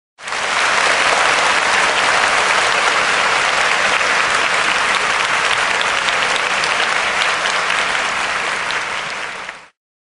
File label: Big Applause